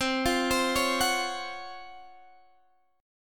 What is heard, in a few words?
Db Major 11th C